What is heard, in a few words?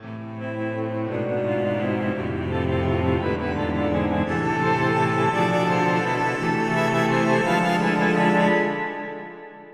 note shocking